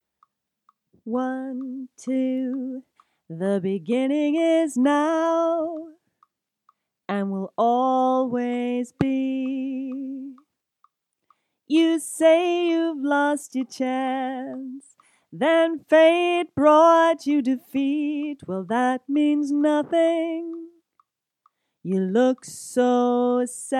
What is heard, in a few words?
lead vocal